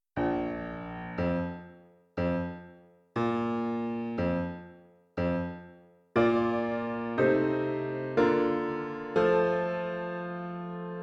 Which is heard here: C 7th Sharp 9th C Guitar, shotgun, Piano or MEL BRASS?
Piano